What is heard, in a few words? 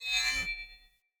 Spear Whoosh Accent; Weapons; Cleansed Spear